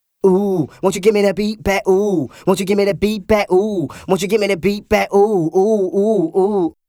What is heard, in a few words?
Chandler TG 1 Vox Comp 9db gr